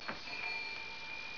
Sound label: entrance; 12000 Graphics Pack - A Complete Graphics Collection For Your PC